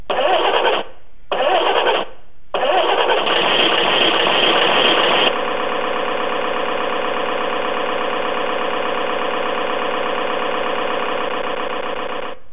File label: engine; Leyland